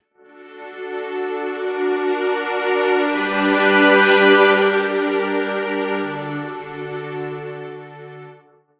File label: I, raising the fifth creates a nice chromatic passage from I to IV, with the +5 resolving into the third of the IV chord; altered chords